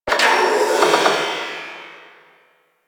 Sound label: Gas Machine; Warframe; Corpus Gas City; Ambience